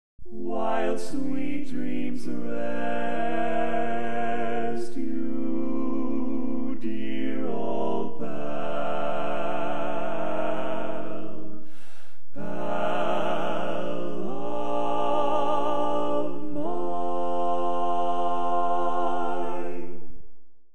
Tenor, barbershop

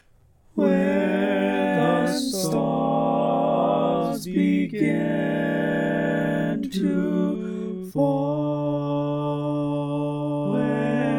Tenor

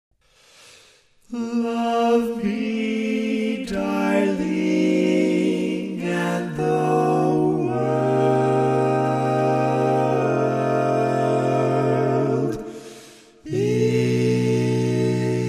Bass, Barbershop Tags; Old standard version

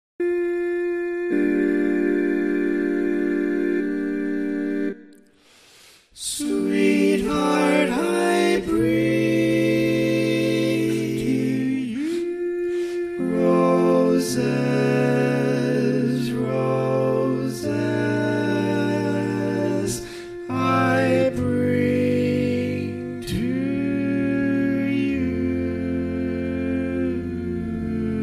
Lead, barbershop

